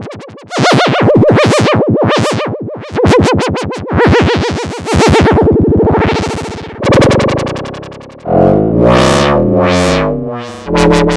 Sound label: Voice FM Mod